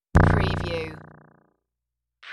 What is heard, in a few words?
Squiggle